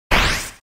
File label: spike; story; boss fight